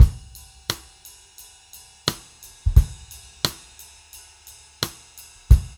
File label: DRUMS, Funky Ass Loops